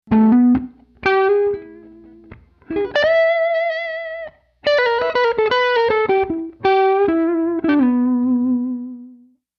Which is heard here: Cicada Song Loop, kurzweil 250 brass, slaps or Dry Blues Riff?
Dry Blues Riff